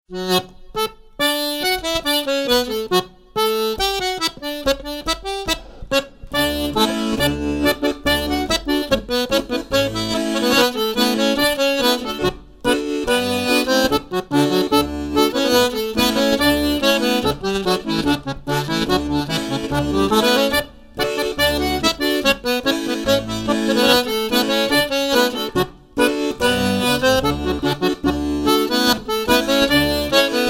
Molly Dance 1x Chorus; Morris Hey

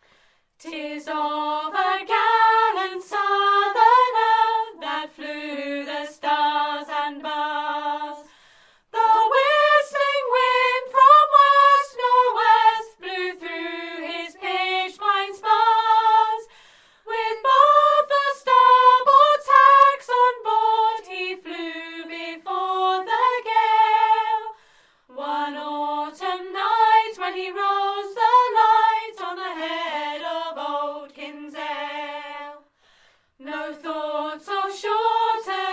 Roots Acappella; Gallant Southerner

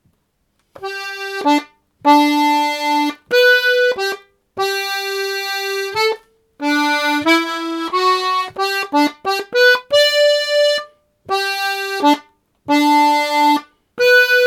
Cross Hand Polka - slow

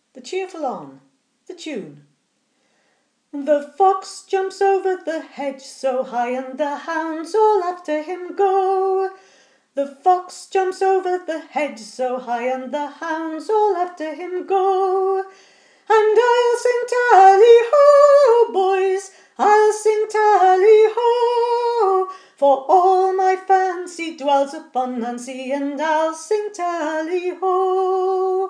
tune Cheerful Arn

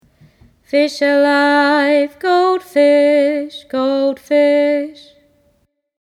Street Cry - Goldfish; EFDSS Marylebone Resource

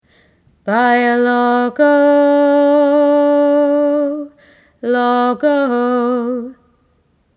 Street Cry Logs; EFDSS Marylebone Resource

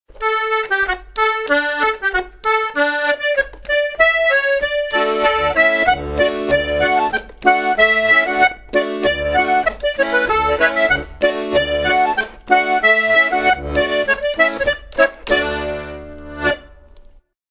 Waltons Parade 1x Chorus; Morris Hey

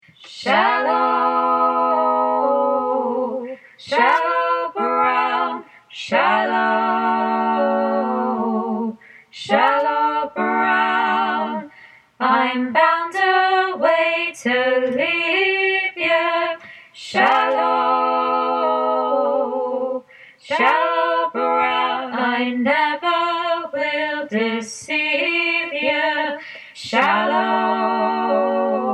Shallow Brown 3 Parts

